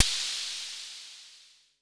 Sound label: FX; MPC DSM-2